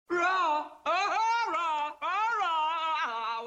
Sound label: laughter; jack lemmon